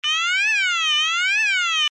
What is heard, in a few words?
alarm tone, sounder alarm tone; sounder beacon